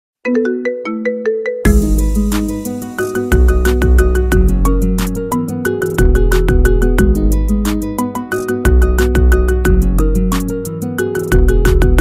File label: Marimba